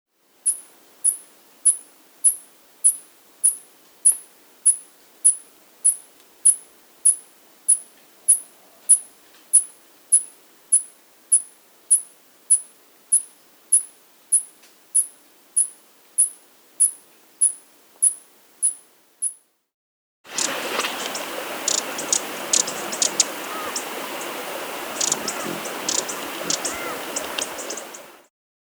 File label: Speckled Hummingbird (Adelomyia Mela; S. America